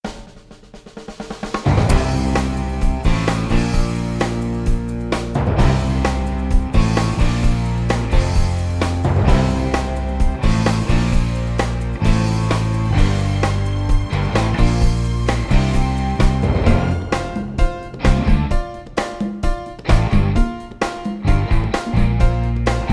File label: Music background pads